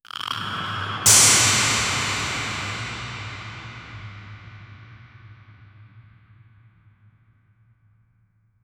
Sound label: the sound of a successful, alchemy